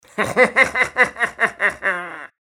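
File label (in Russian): звук смеха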